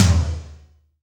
TOM TOM, Roland

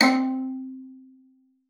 C4, VIOLA FINGER; Xsample VOL-5

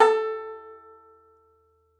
Tenor Banjo; E-MU Producer Series Vol. 7 – Old World Instruments; Plucked Strings

